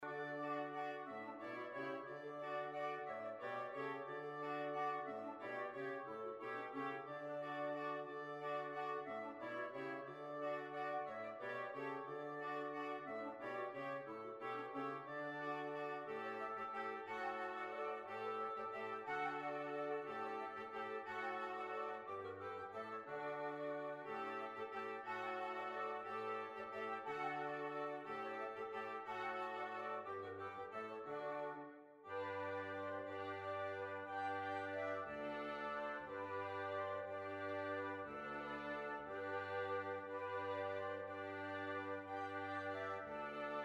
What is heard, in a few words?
Waltz; Lord St Vincents Waltz